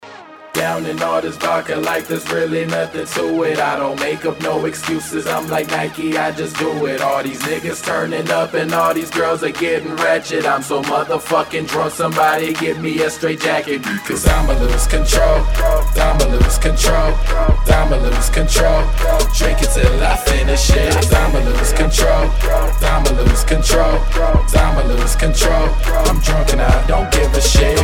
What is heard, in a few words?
unmastered rap music; hip hop